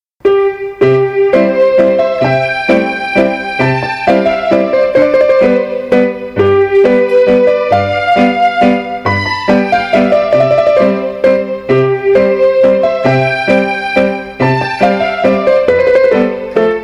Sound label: Music